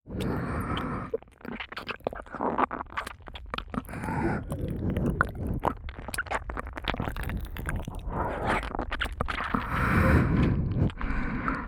(左)耳奥舐めねっとりエッチ, KU100 パンパン系効果音版

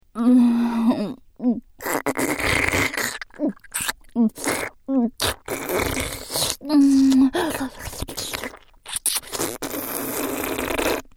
バキュームフェラ; メインキャラ

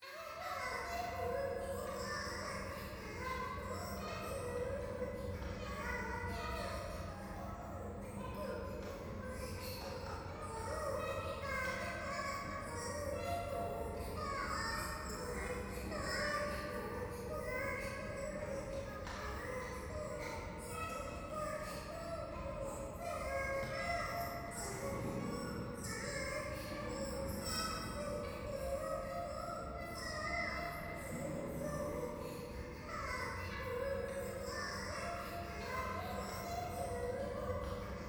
教会 可愛い喘ぎ 電車

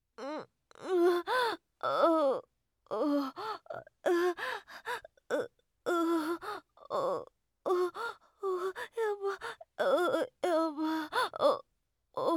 オホ声; SEなし

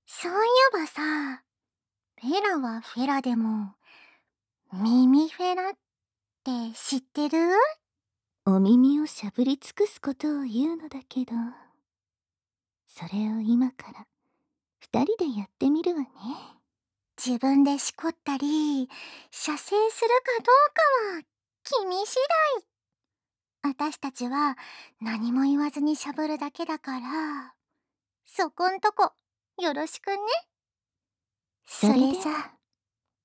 お耳フェラ; フェラはフェラでも、お耳フェラ♪たっぷりじっくりねぶりつくすね♪

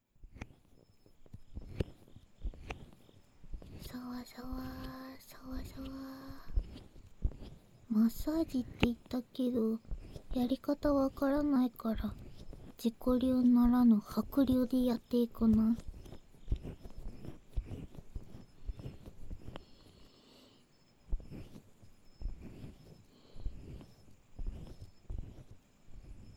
お耳のマッサージをしてやろうぞ【お耳のさわさわ・お耳タッピング・お耳ふさぎ・タオルでお耳ごしごし・あわあわお耳マッサージ】, ASMR

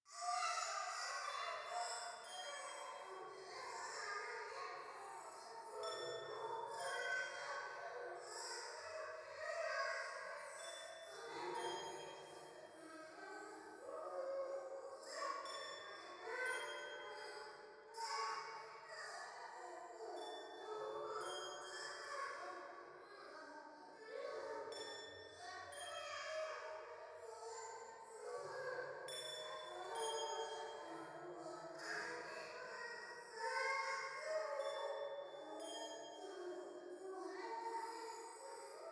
夢の中 シャブ喘ぎ 寺

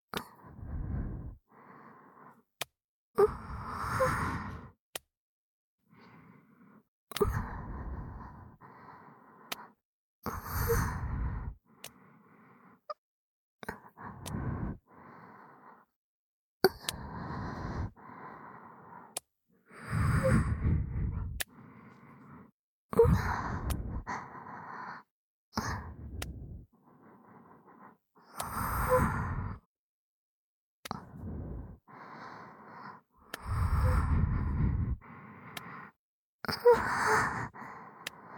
(右)おまけの耳元囁き喘ぎエッチ, 黒耳 パンパン系効果音版

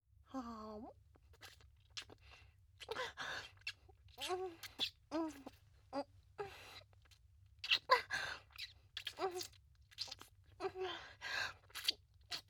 フェラチオ; SEなし

